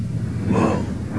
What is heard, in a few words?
whoa